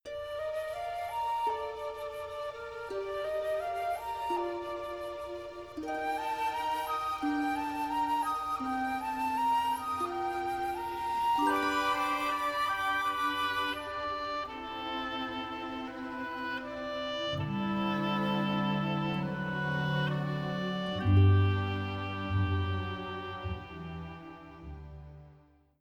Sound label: SCENE CHANGE; Beauty and the Beast